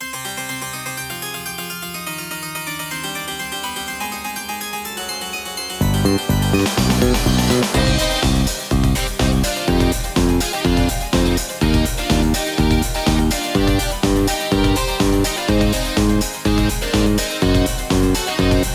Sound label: harpsi